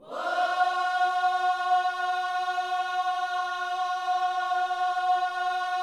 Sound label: "Whoa", Gospel vocal, F#4; Spectrasonics; Vocal Planet Vol.1 Gospel; akai